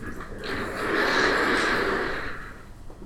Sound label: chair wheel